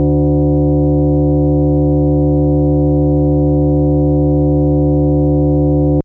FM Sawtooth DX100 D2

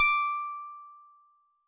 SolidBass DX100 D7

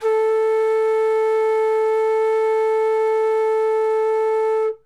Wodden Flute A2

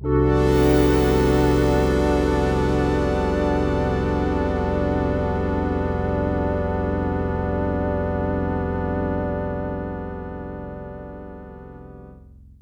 PAD Aqua Chord Spread

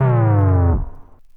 Juno106 Sub Dive Vinyl Synths C2; vinyl fx

